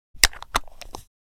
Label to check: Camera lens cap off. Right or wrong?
Right